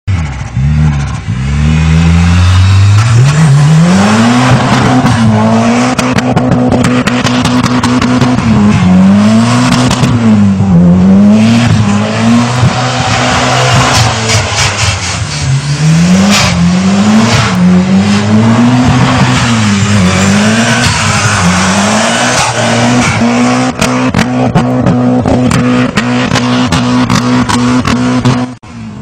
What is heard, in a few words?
Car Sound